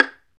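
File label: STR Violin Pizzicato, Solo Violin FX; Roland L-CD702; Solo Vln FX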